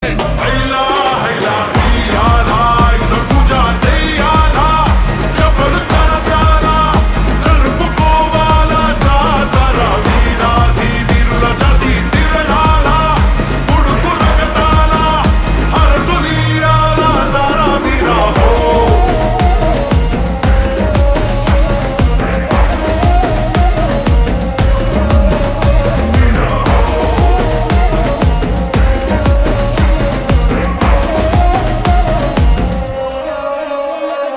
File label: Ringtone Slowed Reverb; devara; telugu